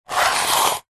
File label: table tennis portable retractable net pull open out plastic housing; zapsplat